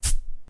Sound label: hurt, monsters tortuga